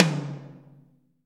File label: Short Reverb Tom Drum C# Key, Royality free tom drum tuned to the C# note